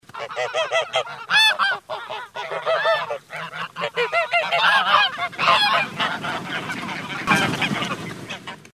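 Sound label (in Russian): gusi na vodoeme, geese on a pond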